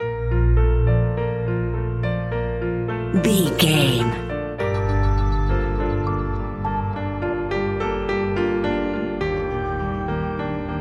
ancient temple ruins loop

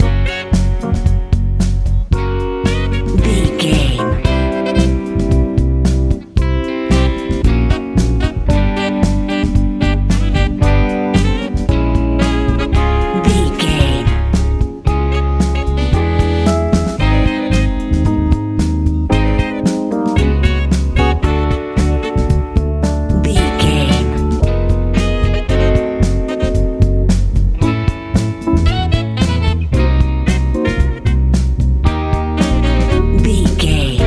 funk soul

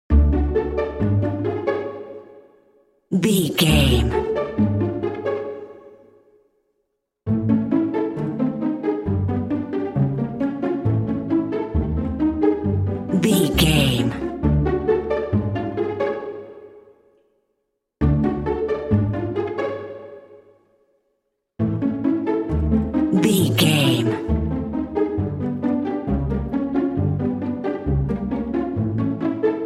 stealthy tiptoeing real strings only

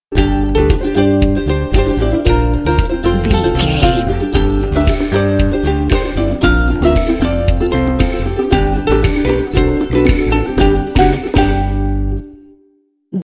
happy ukulele bumper

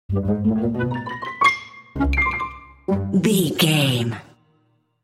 cartoon

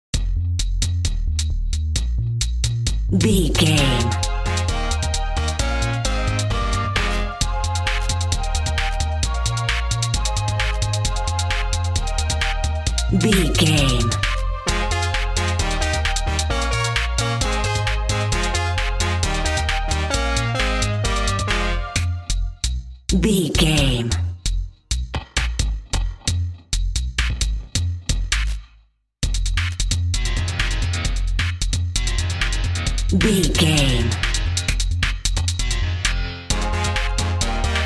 old school video game no lead

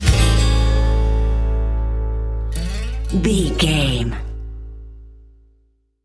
footloose and free sting

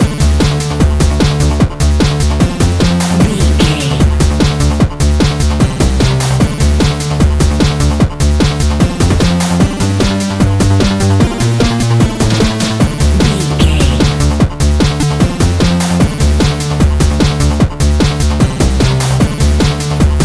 retro 8 bit game stage loop

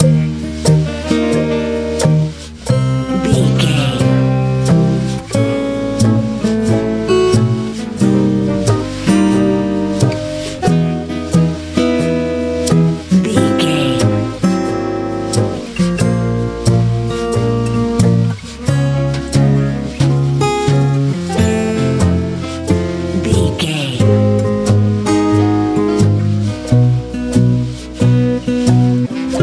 nice beach music

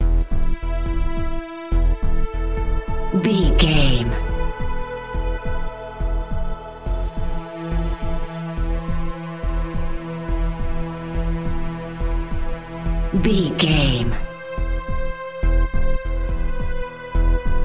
sci fi pack song omnious surrounding

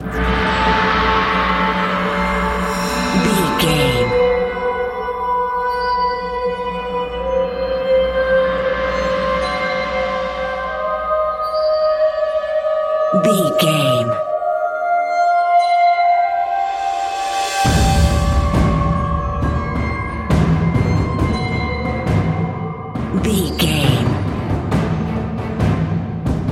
action movie trailer

